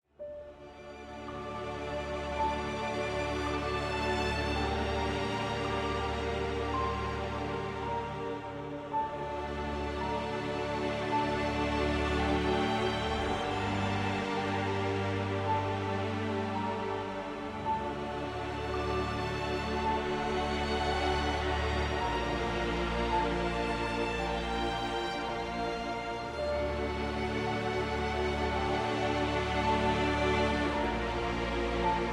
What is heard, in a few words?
Lullaby